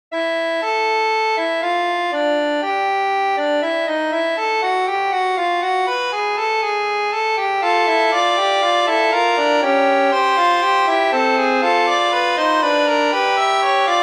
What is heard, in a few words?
fughetta minor; umezawa sadayuki